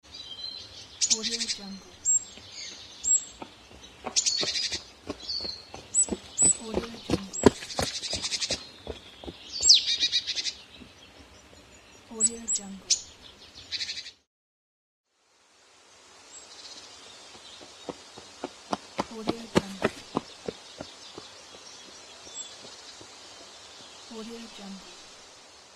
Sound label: running through the forest